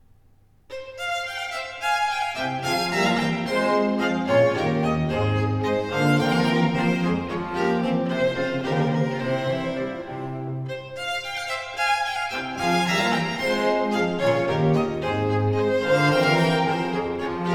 Sonate 11 in G minor - 4. Allegro; Arcangelo Corelli; Corelli - Complete Works; Klassika

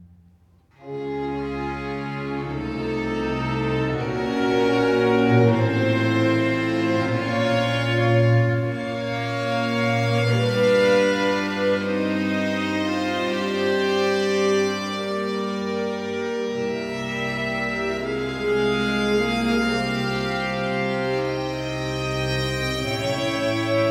Sonata a Quattro 3. Grave; Arcangelo Corelli; Corelli - Complete Works; Klassika

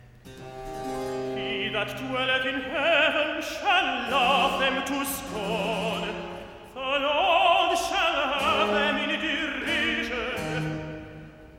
Recitative-tenor He that dwelleth in heaven; Handel Masterworks

